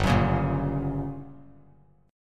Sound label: G Minor Major 7th Flat 5th G, Bass Standard; strummed; JGuitar